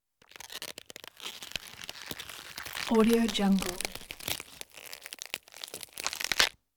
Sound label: celery break; prosoundfx